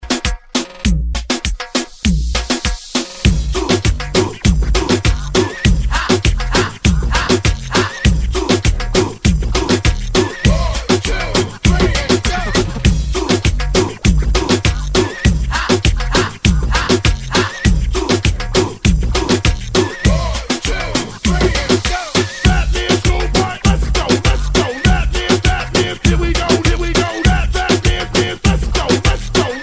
20s Reggaeton Remixx 100 Bpm; DJDOLLS

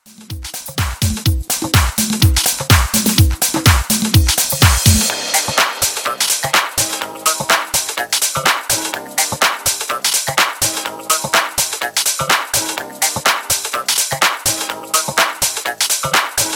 Classic House 125Bpm

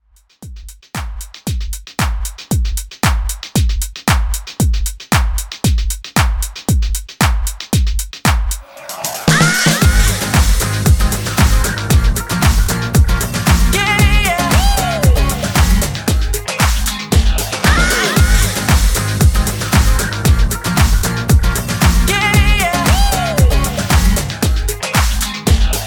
Club Extended Mix 115 Bpm

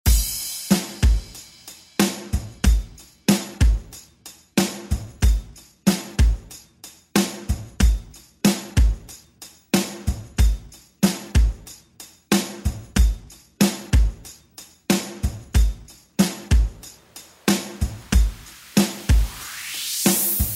90s ReDrum; DJ Mhark

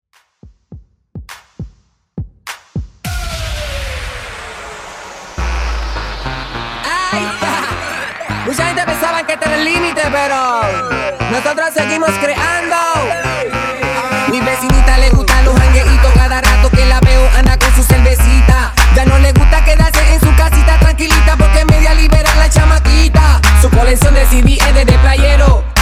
Twerk, 103 BPM

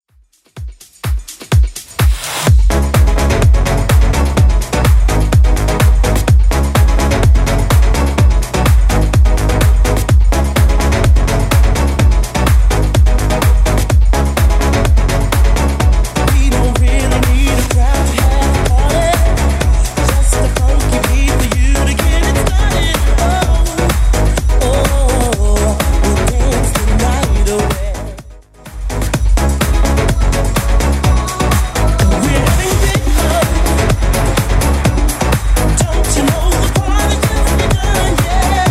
90s 126bpm

